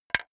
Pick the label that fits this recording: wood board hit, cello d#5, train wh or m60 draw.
wood board hit